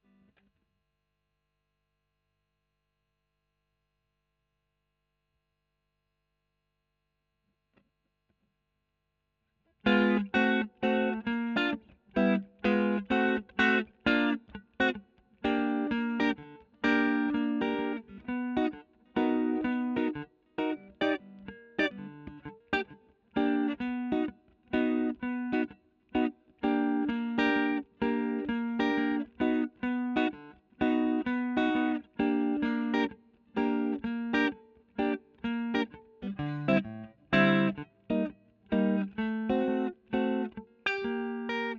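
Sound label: Electric guitar Royer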